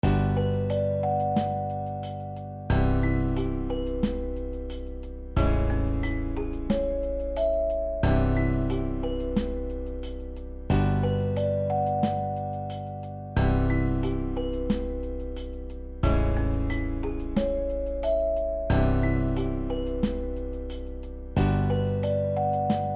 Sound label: Elevator Music (loop); OpenBundle; Background Music and Loops